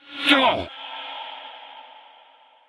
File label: Wound Crit; King Terenas